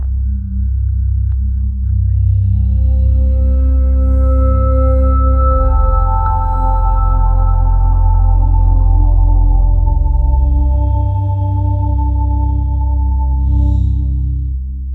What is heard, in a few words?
ambient meditation astral